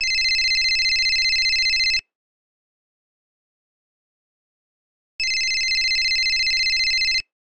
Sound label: Cellphone